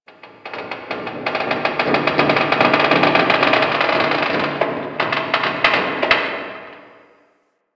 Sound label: Metal Creak; Ambience; One Offs; Warframe; Quests; The New War; Drifter Camp